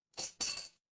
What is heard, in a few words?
glass shatter